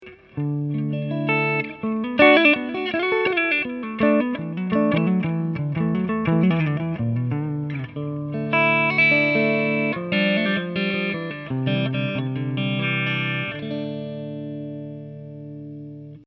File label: clean prs hp